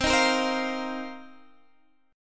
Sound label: F 7th Suspended 4th C